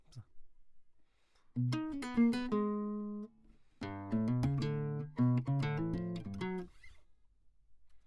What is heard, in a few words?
Classic Guitar